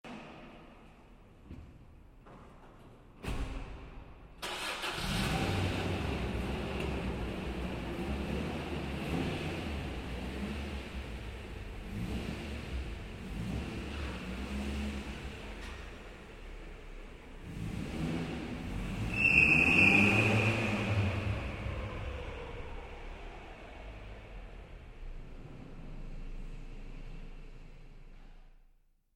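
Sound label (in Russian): автомобиль на подземной парковке заводится и выезжает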